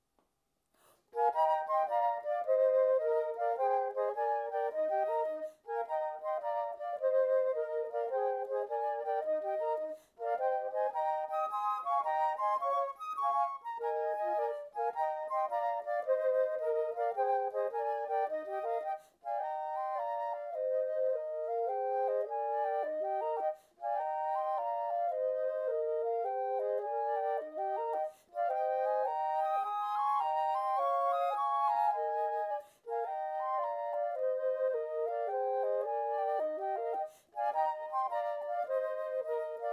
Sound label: trio w percussion; toe ensembles bk 2